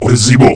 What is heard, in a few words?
pain; opentdm; Zumlin